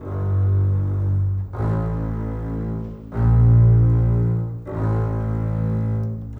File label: Bass Cello, Cinematic; Sonic Foundry (Sony Creative Software); Orchestral Series VOL-3 - Cinematic